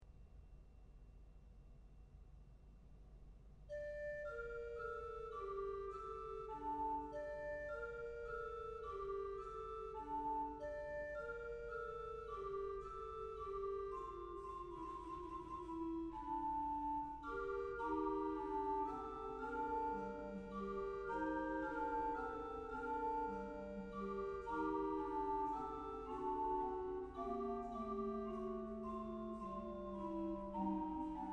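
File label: Fuge, organ; 1787; Silberbauer; Kdousov; Murschhauser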